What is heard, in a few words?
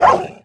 hellhound death; Crypt of the NecroDancer